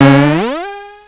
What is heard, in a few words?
Gate Open; Crystal Crazy Demo; Casady & Greene